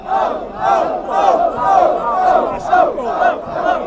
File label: chant, fanchants; bohemians